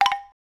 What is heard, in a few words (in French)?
bambou mel